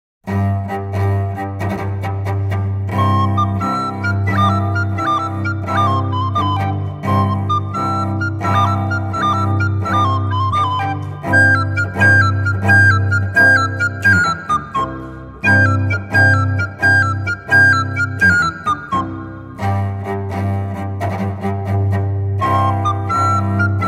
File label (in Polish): duduk